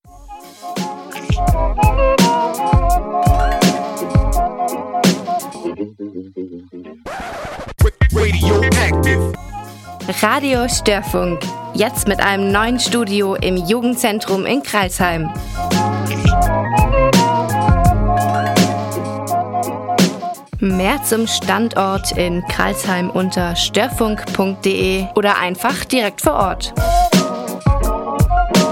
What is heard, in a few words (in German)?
jingle